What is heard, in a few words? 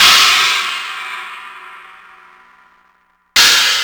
Dirt Crash, percussion